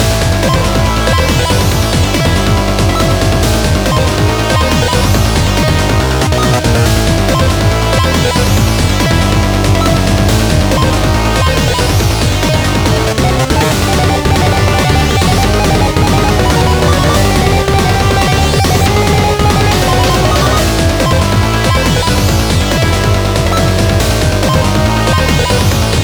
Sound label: Boss Loop Stereo